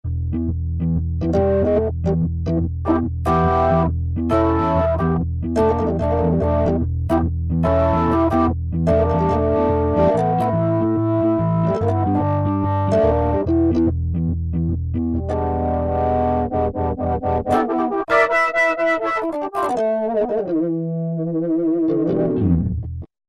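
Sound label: B3 Overdrive + RS, Korg MSC-9S Organ; B3Ovdr+RS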